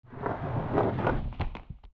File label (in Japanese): zuruzuru